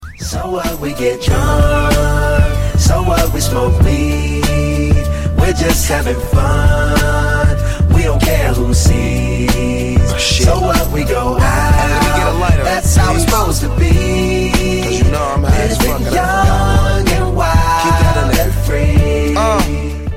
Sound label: iphone smoke ringtone